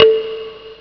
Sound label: kalimba; ST-XX Amiga Soundtracker Sample Packs